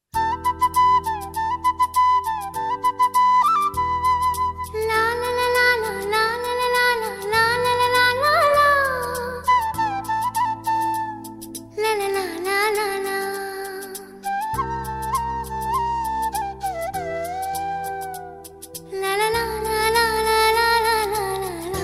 BGM Humming Telugu Ringtone

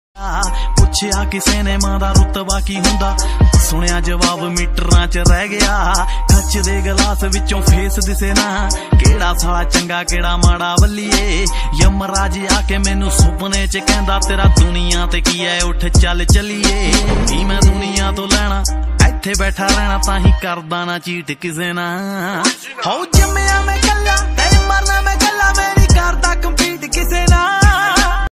Dance Punjabi Ringtone

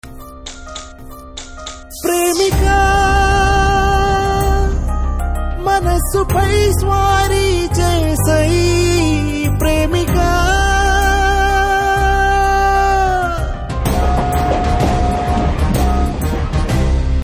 Telugu Ringtone

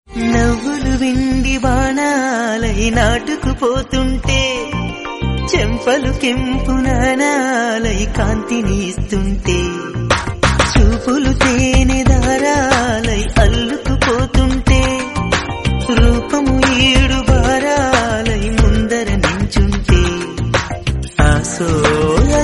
Telugu Ringtone

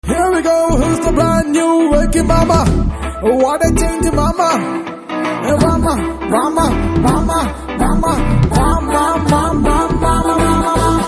Theme Telugu Ringtone; Venky Mama

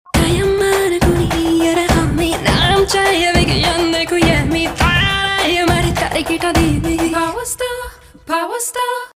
Telugu Ringtone; Komaram Puli

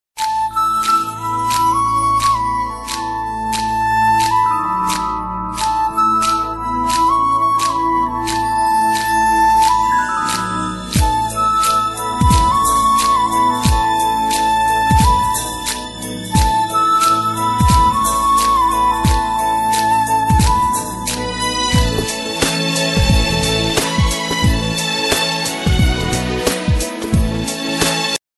Love Tune Ringtone

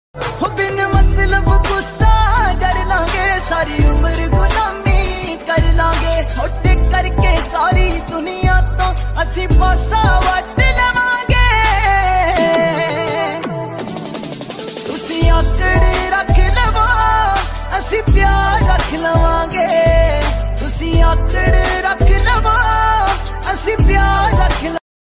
Popular Punjabi Ringtone

